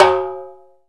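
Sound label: low timbale rim; E-MU Producer Series Vol. 2 – More Studio Essentials; Composer; Timbales Xswitch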